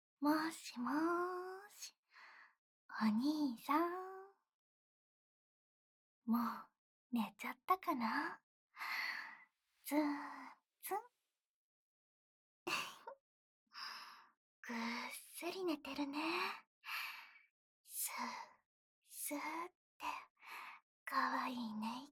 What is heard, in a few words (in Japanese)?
甘ーい吐息でとろとろ深層睡眠導入; 夢喰い夢魔ちゃん